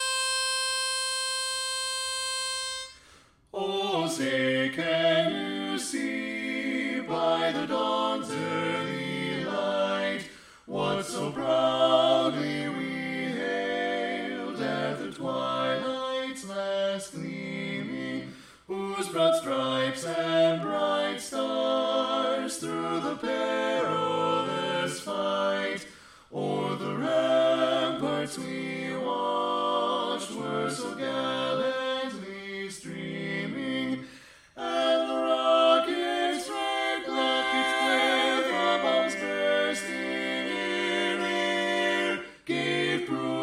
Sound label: Star Spangled Banner (C) Lead Left; saints game